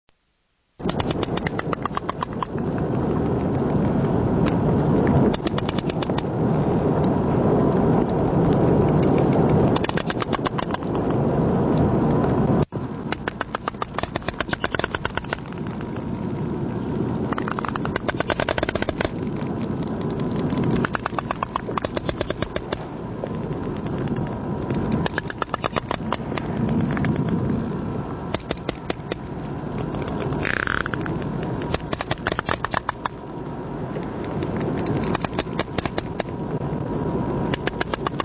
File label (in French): cachalots